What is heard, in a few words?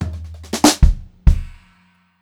fill, Brushed Drum Loops